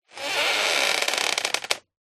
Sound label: household chair creak